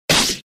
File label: Sfx Lancer Attack Hit